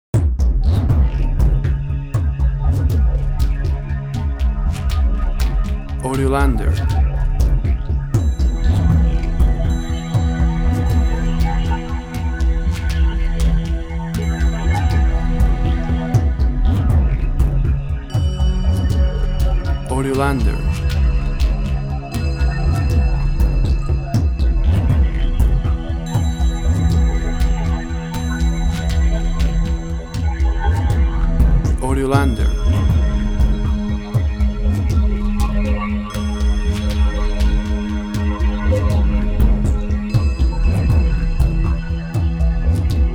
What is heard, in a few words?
Airport